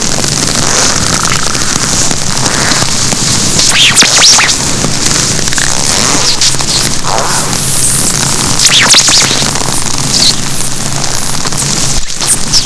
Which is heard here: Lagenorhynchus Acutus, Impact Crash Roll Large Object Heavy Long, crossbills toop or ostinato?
Lagenorhynchus Acutus